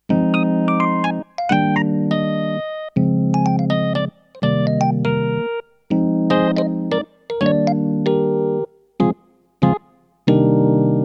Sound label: HP605 Pop EPiano